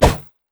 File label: minotaur critattack; LoL; alistar